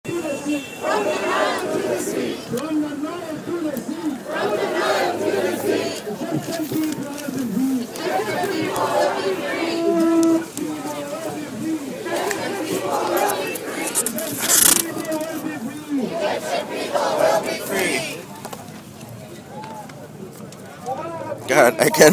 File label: demo crowd; la demo; from the nile to the sea